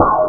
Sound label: boing; Games Galore 3; Asteroids